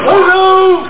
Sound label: FEEDBACK